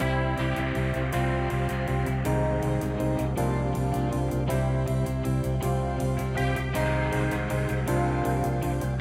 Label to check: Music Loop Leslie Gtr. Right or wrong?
Right